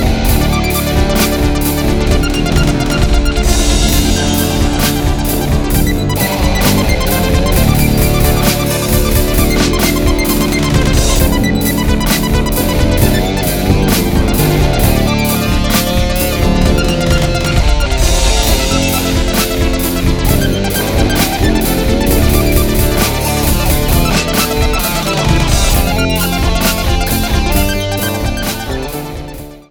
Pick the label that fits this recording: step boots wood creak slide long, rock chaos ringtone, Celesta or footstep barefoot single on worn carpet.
rock chaos ringtone